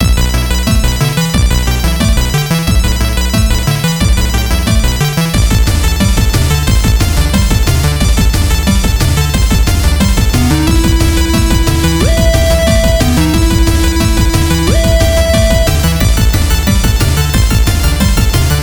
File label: it's a pretty good chiptune